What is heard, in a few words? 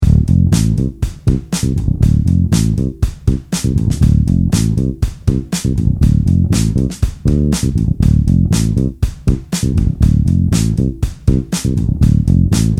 Dm outro